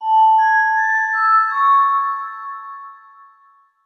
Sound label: Cool Tone